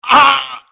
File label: hurt